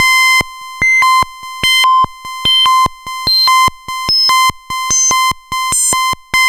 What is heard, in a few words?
FM BEAT, FM LOOPS; Zero-G Ambient 1